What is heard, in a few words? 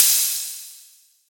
huge open high hat f sharp key